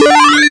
Electro FX; Ueb HE Electro FX

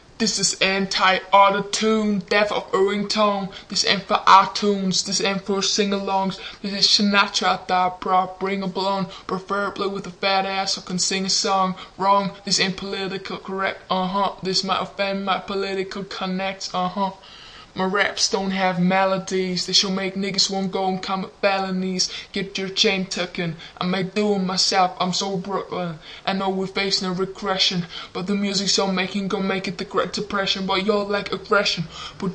anti autotune rap; VOCAL ACAPELLAS

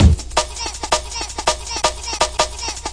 163, drum loops and breaks; 161 to 180 bpm

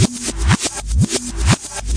121 bpm basic and backwards

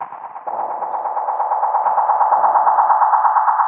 130 bpm atmospheric beat

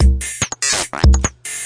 145 lofi blurpy robot break; 141 to 160 bpm

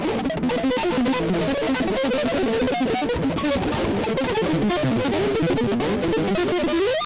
seizure guitar random hammering; Various Strange Sounds and Effects

